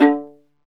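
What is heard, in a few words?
viola solo, marcato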